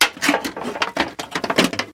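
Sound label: rustling scattered construction tools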